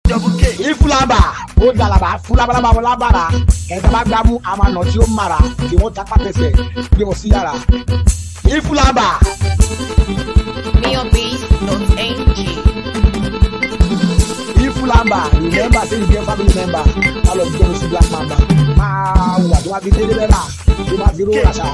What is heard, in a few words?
Beat